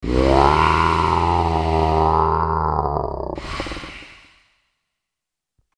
Aliens Monsters; FXHome

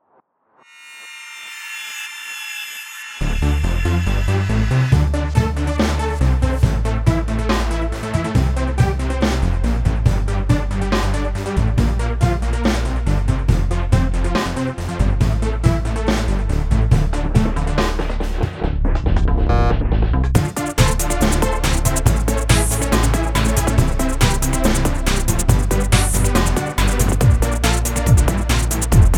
Dance Dubber

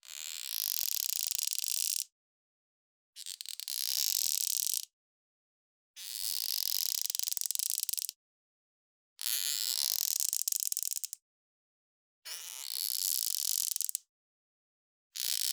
Metal Coathanger Slow, Squeaks and Creaks; NTG3; Russell Gorsky; Squeaks and Creaks Two

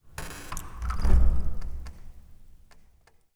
Wooden Door Creak; 99Sounds; The Warehouse

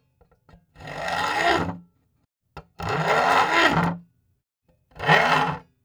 Hand Saw; Hardwork; Nikko Barrera-Amaya

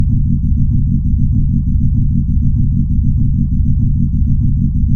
Power Core; Digital Rain Lab; Driving Forces

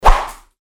Fighting Swing Sound; FXHome

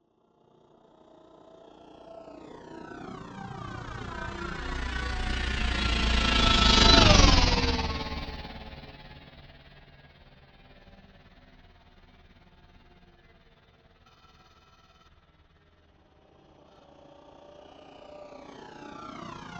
spaceship heavy pass by; Chupapsound; DOPPLER FX

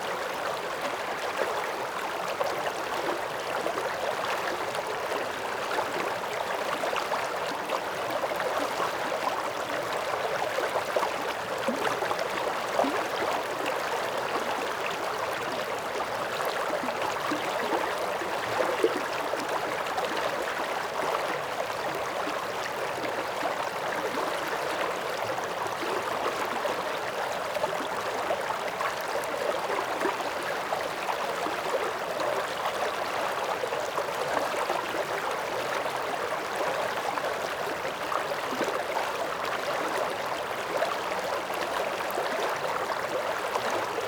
River mid field LOOP; Mountain rivers and streams; Ivo Vicic

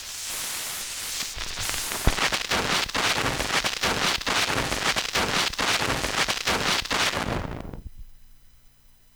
vinyl crackle; 99Sounds; Vinyl Noise SFX

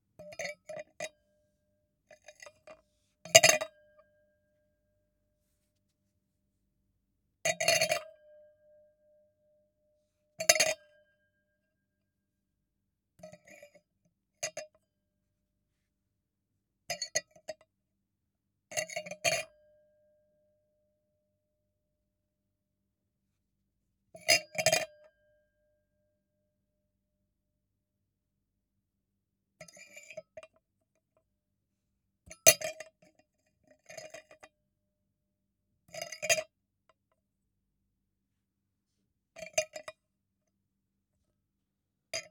CUP, Crockery & Surfaces; TheWorkRoom Audio Post; Empty

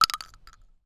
Shotgun Shell Land Concrete; Stuart Duffield; Bullet SFX

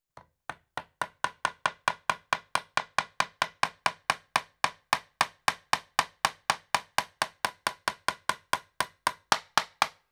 Tack Nail Hammered; Construction; BBC Sound Effects Library

